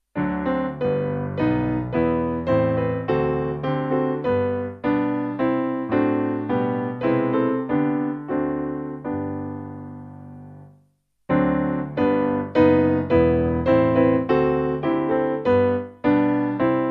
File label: Piano